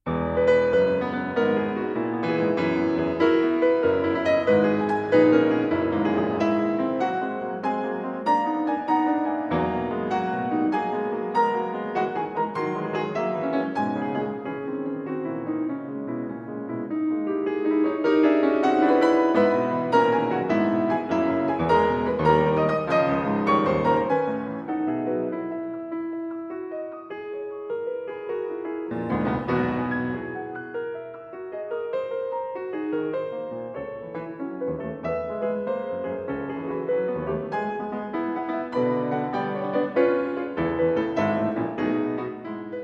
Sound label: Piano Suite in E Major III. Allegro Barbaro